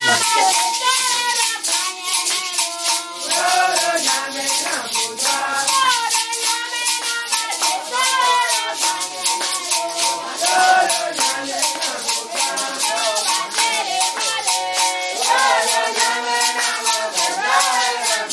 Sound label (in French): chant